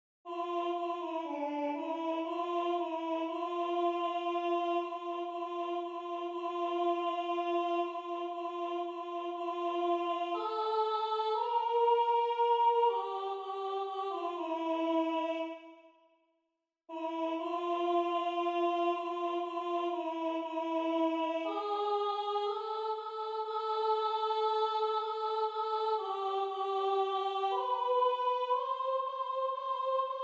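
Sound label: soprano; Západ slunce; 2.soprán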